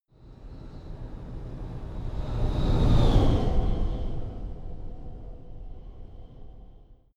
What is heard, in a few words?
sci-fi vehicle train passing long; raumschiff